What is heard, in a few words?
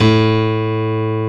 M PIANO A2; E-MU Formula 4000 Series Vol. 2